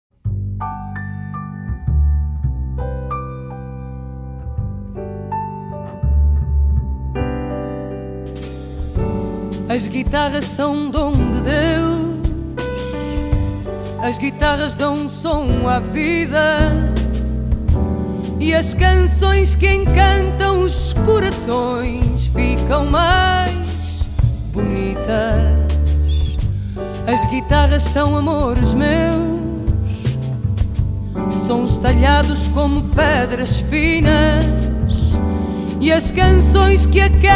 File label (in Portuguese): As Guitarras